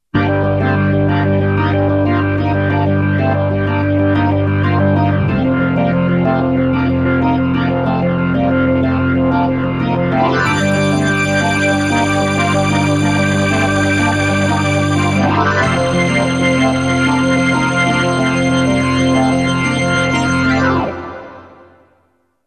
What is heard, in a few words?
yamaha ex